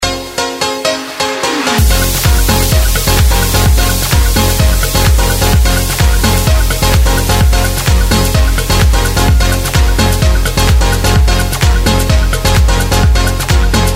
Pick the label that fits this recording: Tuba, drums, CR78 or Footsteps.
drums